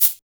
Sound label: LOOSE SHAKR, SHAKERS